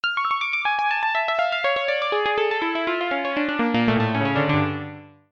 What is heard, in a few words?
synth piano analog piano